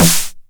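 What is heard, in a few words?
909 SD Tape, snare drum; Goldbaby Tape808 & Tape909; The Tape 909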